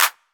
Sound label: smooth clap D key; percussion; tuned percussion